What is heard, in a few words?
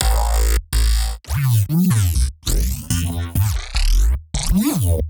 Nokey Bass Shots; neuro; BIG5AUDIO